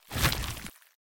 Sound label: concrete pouring